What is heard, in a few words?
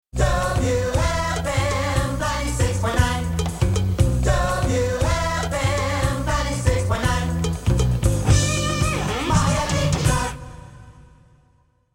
Jingle, radio